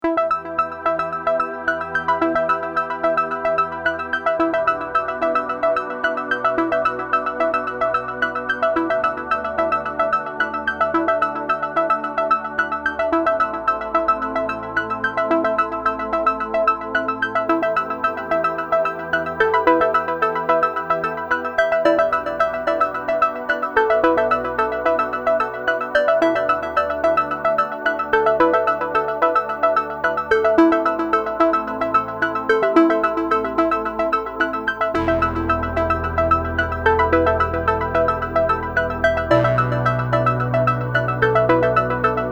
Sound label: sequence, MS20